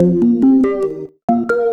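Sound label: Lead Jazz organ, Drum & Bass Keys; Sonic Foundry (Sony Creative Software); Knutrix Drum & Bass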